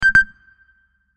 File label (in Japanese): ヒヒッ